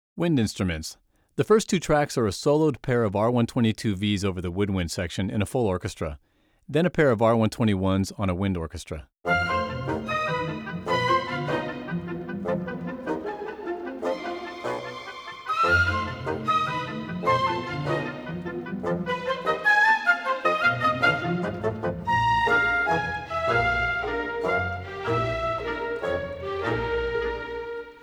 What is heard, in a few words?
Wind Instruments